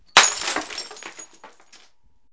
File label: B GLASS